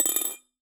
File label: Drop In Glass, Medium Wine Glass